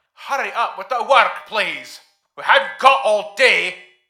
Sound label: faster